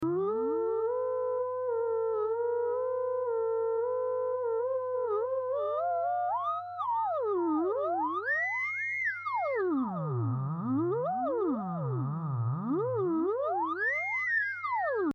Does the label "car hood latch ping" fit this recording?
No